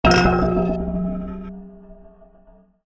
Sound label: metallic impact